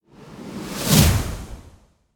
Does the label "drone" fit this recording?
No